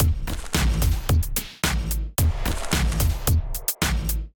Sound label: Resurrection Kit groove 110 bpm, Drum Essentials; MIDI Clips; Ableton